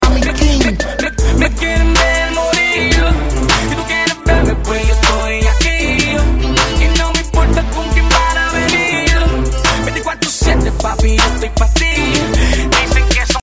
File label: Reggaeton